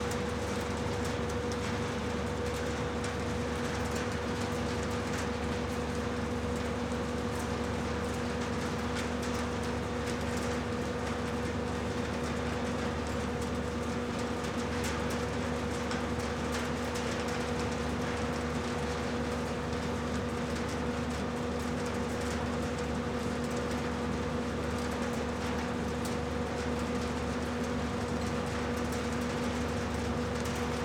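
Ambience, Industry, Exterior, Factory, Churn, Whine, Condensation drops; Hzandbits; Industrial Exteriors I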